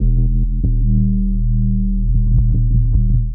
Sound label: Pulverizer Bass; Sonic Foundry (Sony Creative Software); Knutrix Drum & Bass